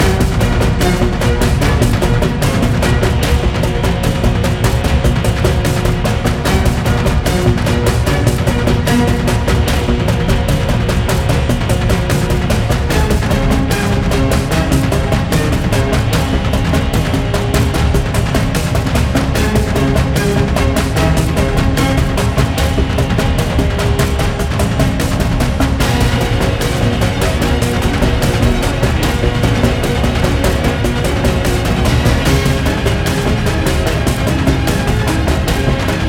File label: tension guitar violin; By Burak Sound Bank